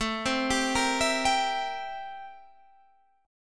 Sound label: A-Minor 7th Flat 9th, chord